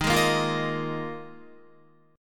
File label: Eb Suspended 2nd Suspended 4th, Guitar; Ebsus2sus4 strummed; JGuitar